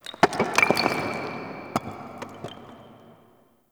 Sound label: Dust Fall Medium; Warframe; Ambience; Orokin Moon